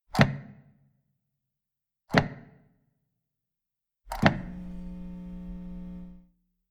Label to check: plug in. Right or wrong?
Right